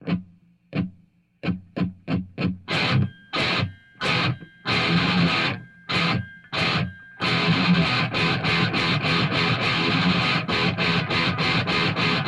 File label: Riff Tapes